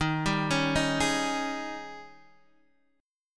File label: D sharp 11th D sharp; arpeggiated; x,6,5,6,4,4; JGuitar